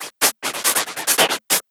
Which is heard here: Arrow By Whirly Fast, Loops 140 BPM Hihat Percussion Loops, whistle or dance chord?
Loops 140 BPM Hihat Percussion Loops